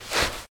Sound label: fs sand, singlesteps